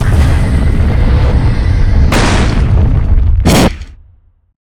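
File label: spawn; realtempus; thedead3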